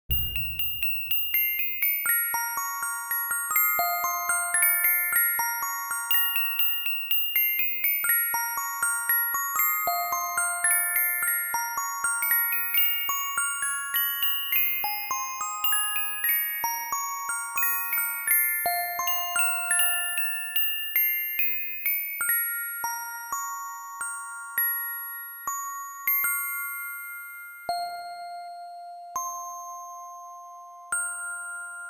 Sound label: Music Box; quest for glory so you want to be a hero mt-32 ms-dos gamerip 1989